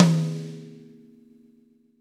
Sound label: V-Pop Tom 50, Roland BK-5 Toms